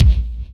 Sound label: BD; Best Service; Dance Mega Drums